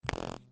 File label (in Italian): auricolari bluetooth; aliexpress